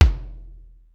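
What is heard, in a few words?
garage kick; sr16 bd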